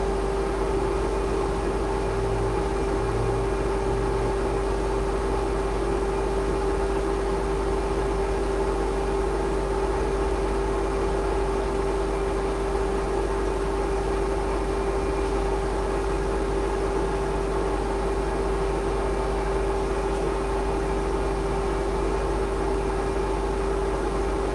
industrial ironworks oxygen compressors shop oxygen compressor; fvtt sonniss gdc audio